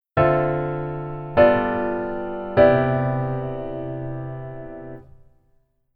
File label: Keyboard Voicing Basic